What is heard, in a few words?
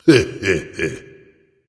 heh heh, voice; smite; voicepack; ymir